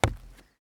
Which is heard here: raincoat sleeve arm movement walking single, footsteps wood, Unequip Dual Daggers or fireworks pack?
footsteps wood